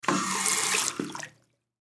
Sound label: Ship Bathroom Sink On Off Fast Head